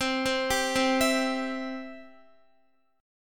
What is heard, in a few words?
C Major, Guitar; arpeggiated; JGuitar